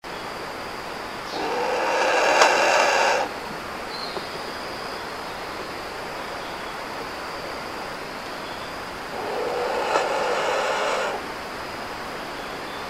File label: Kagu; پرنده کاگو